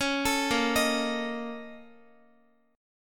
A sharp Minor Major 11th, down, Guitar; arpeggiated; 4F,4A,5C,5G,5C,6E; JGuitar

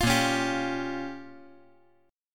A sharp Minor 6th A sharp down, chord; strummed; JGuitar

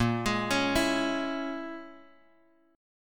Bb Minor 7th Flat 5th, Guitar, Open G

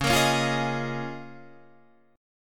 C sharp 6th Add 9th C sharp down Guitar Open D

